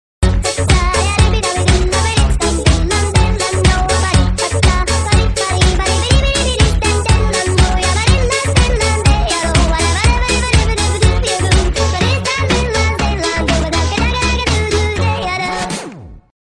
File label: Great Hip Hop